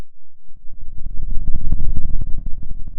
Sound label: Sound of a dinosaur